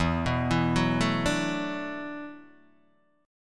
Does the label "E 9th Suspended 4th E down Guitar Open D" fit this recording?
Yes